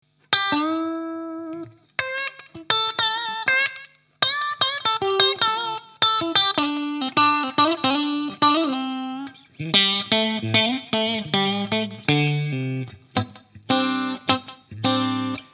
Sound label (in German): Steg Mitte Clean